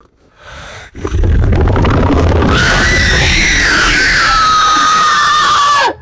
wet gurgling breath and reverberant monster scream